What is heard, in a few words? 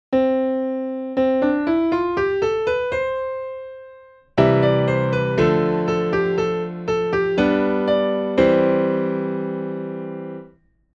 music